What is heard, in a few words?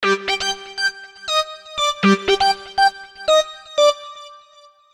Rave, notifications; MyPhone A919 Duo